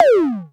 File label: Klone Dual Percussion Synthesiser tom